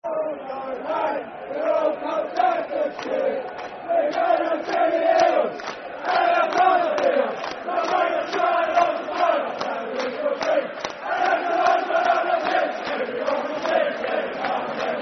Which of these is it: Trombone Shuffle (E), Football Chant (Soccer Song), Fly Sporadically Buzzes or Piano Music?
Football Chant (Soccer Song)